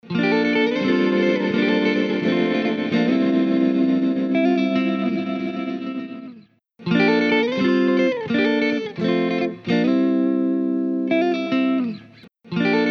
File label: Guitar; Undulator